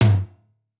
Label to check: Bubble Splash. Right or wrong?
Wrong